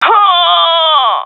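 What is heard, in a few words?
death, female; vo; Counter Strike Model Pack; CS:GO; seal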